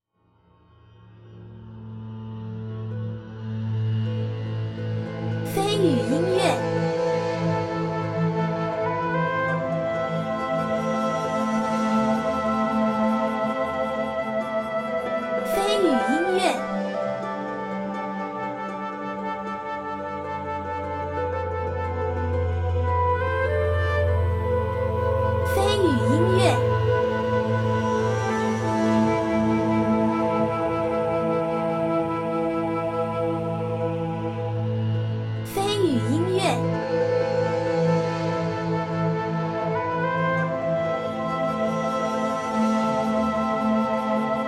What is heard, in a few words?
bg area contention region